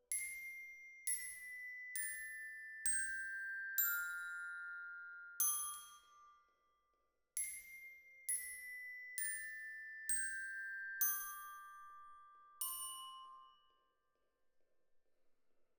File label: Glock Close, Jazz for piano